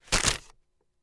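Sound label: Paper Grab More Force; paper mvmt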